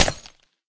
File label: glass; minecraft